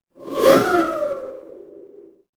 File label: transition whoosh